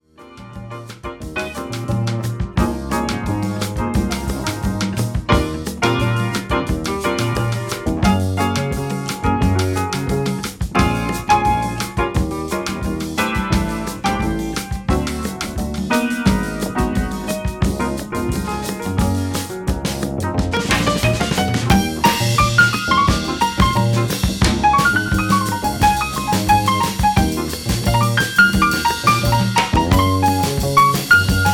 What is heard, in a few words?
drums records instrumental